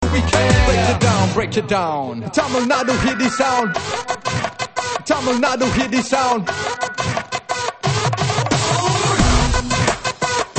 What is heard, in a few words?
Tamil Semozhi Beat